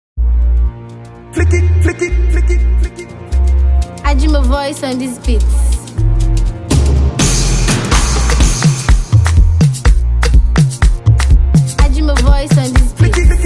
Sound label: DRUMS DANCE